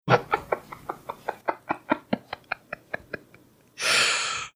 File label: laughing after playing clip